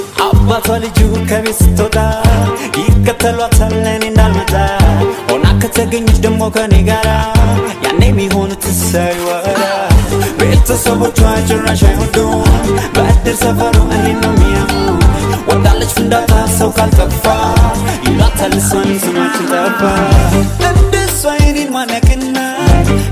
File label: ethiopian cartoon music ringtone